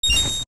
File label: Get Rupee; OOT; Zelda